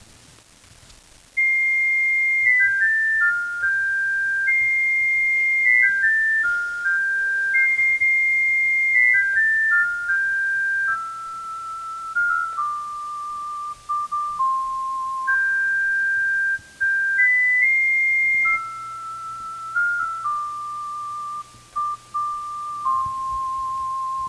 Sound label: bionicle music